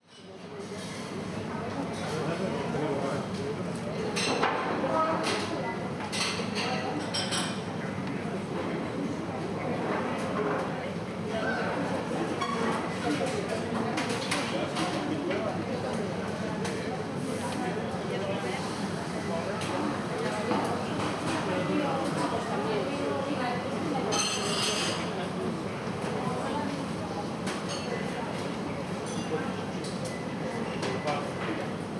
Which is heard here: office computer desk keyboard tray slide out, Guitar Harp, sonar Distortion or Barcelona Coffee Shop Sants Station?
Barcelona Coffee Shop Sants Station